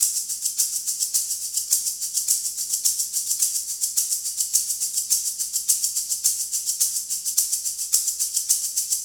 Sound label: Garage Funk Shaker, 70s Analog Funk; Sonic Foundry (Sony Creative Software); What it Is!